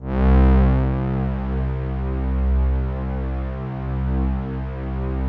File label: OB LOW P